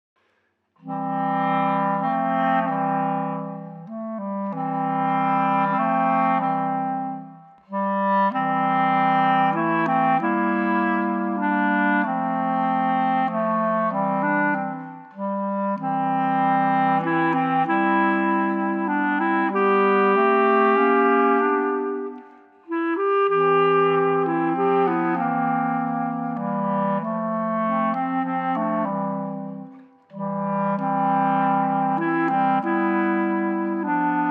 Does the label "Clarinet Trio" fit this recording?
Yes